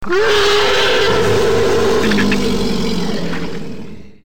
Predator Roar

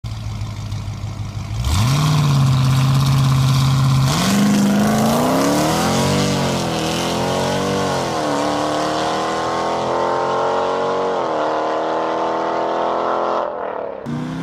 jeep srt8 brutal

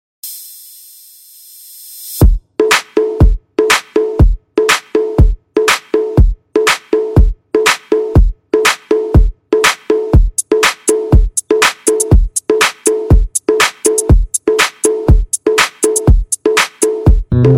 Bass Mixer

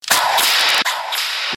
Whiplash

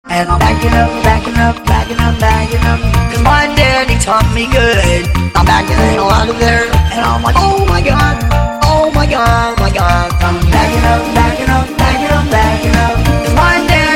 Backing Up Song; meloboom

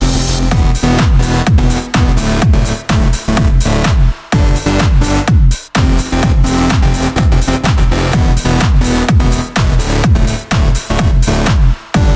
Electro

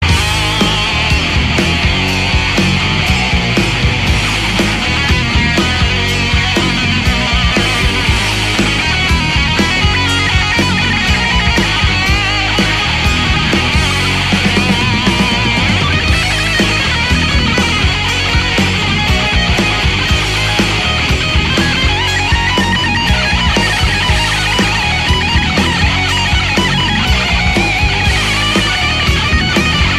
guitar rock

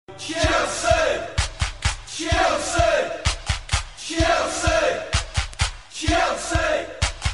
Clap Clap

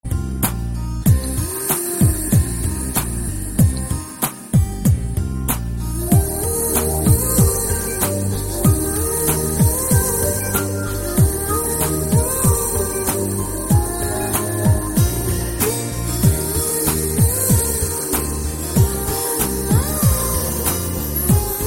Telugu Best Love Mus

